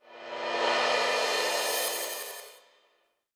swirl

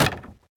Wood Arrow Hit

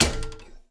Physics-Object Saw Impact

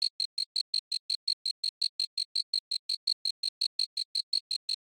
Env Bug Cicada Loop

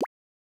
Character Footstep

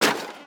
Medieval Tower; Rabbids Multiverse

